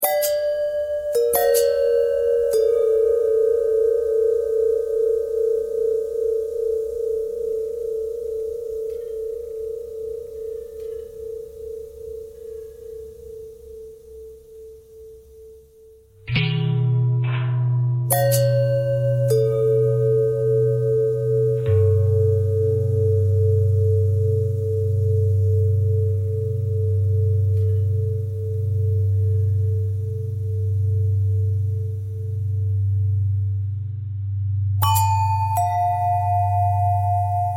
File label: long bell long bell threads, bells